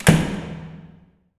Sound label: DOOR